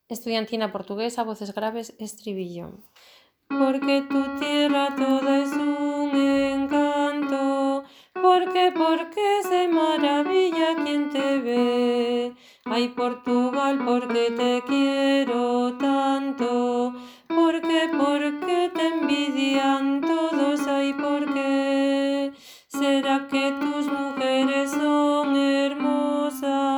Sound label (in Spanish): CANTO CORAL; ESTUDIANTINA PORTUGUESA